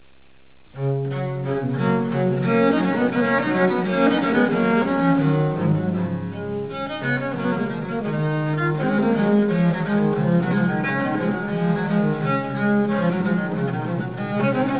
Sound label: vivement viol; couperin